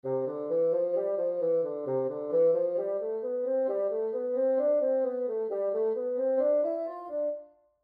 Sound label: Bass Clef Example